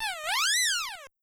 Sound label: Monster Squeak